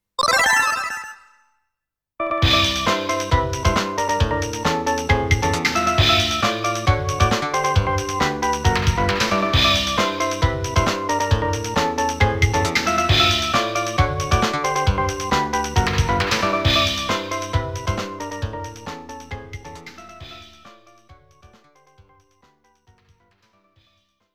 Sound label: Insert Coin ~ Selection BGM; Pulirula